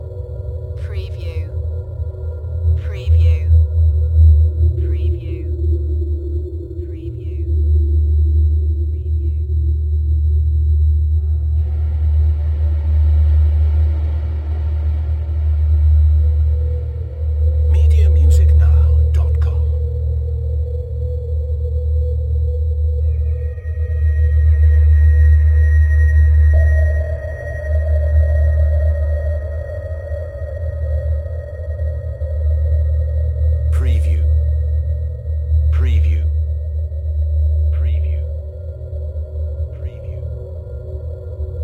Horror Scifi Soundscape - In The Depths (Loop)

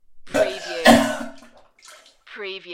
Vomiting

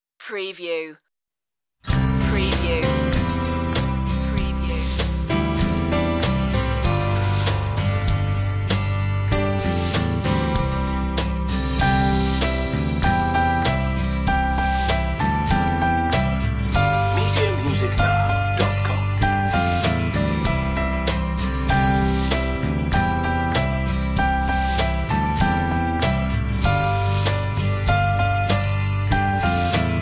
instr version no sound-fx

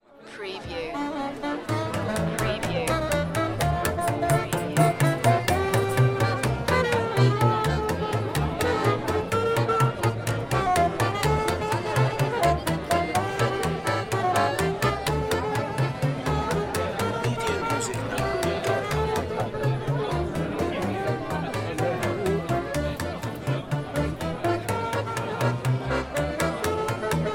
Street Music Jazz musicians

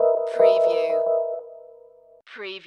Hip Hop Dark Rhodes Licks 90 BPM Straight - E

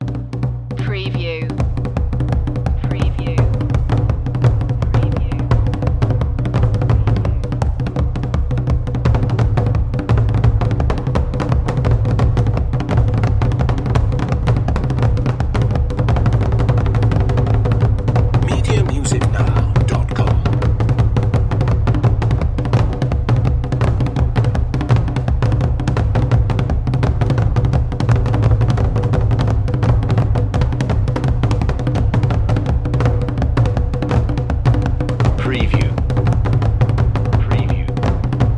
african drums

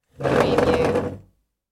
move over chair

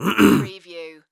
Cough Clear Throat

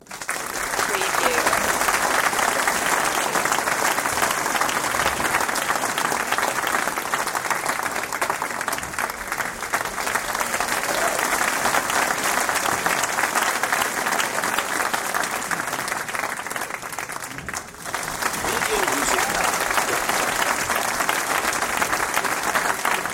Big Crowd Audience Applause; beanstalk audio

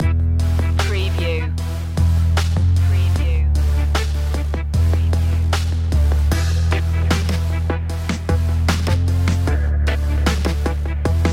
urban jungle

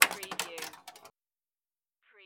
Pinball sound, clank with ball travel

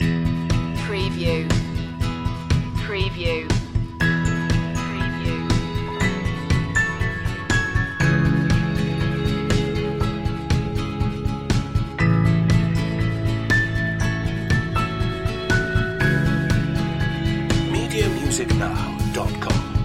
loop

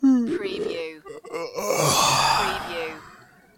Yawning, Male Yawn

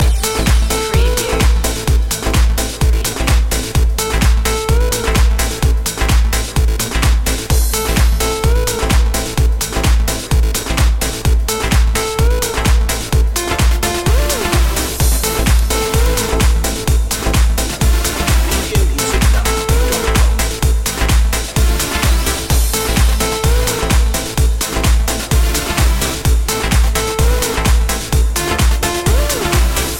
dance loop

